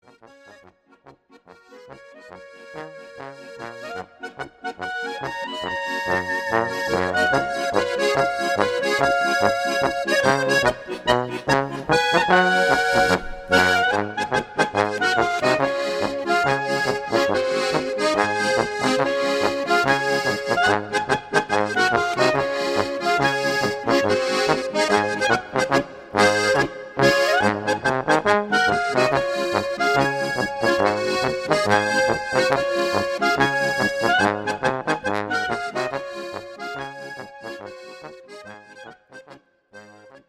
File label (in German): Polka